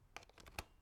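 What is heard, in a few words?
Plug Headphones Into Laptop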